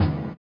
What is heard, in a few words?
SWUSH; Spectrasonics; Metamorphosis